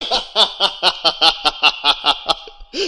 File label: Laughing